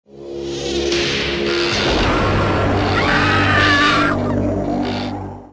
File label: scream; sega megacd; nighttrap